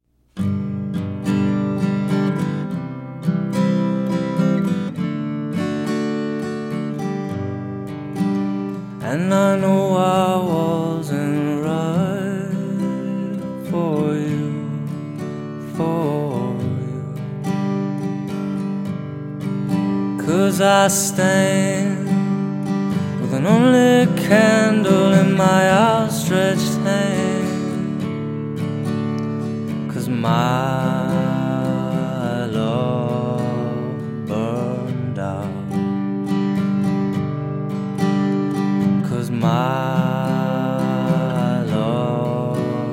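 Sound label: guitar vocal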